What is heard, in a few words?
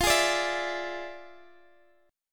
E 7th F, guitar; E7/F; strummed